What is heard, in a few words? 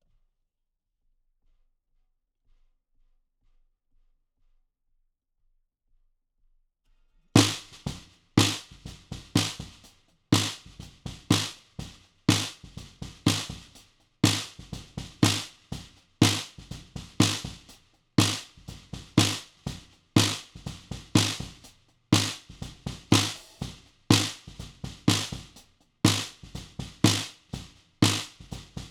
Snare